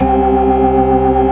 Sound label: ORGAN ALL STOPS; Keith Emerson Signature Series